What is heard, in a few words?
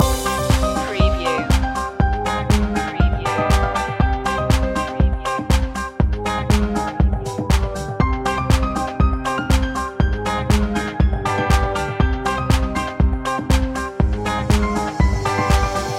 latin dancing loop; media music now